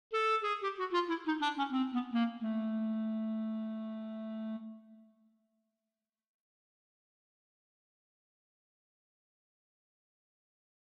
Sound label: Clarinet Trill